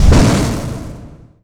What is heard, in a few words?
chaos bolt cast; weapons; dota; chaos knight